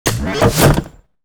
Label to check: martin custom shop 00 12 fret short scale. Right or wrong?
Wrong